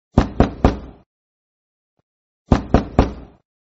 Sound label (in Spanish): Puerta Madera Tocando